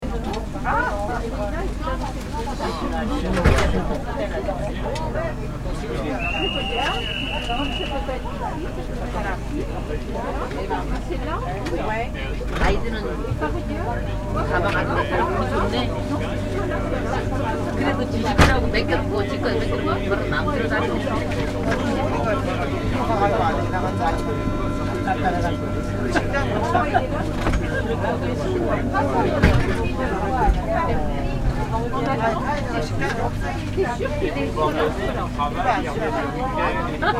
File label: Netherlands Train Carriage